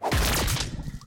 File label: land heavy; Ben 10 - Hero Time Game